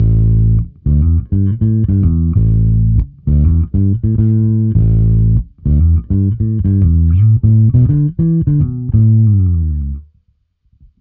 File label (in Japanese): アンプ歪み